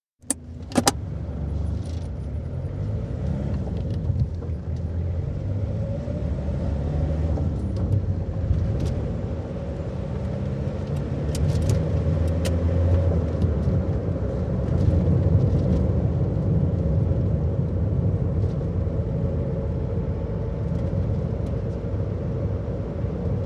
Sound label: Peugeot 309, interior, constant run (19 litre Diesel engine); 1.9 litre Diesel engine, 1986/7 model; Car; BBC